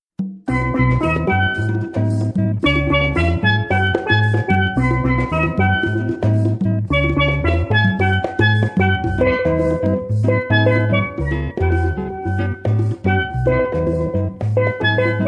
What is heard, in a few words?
Caribbean Band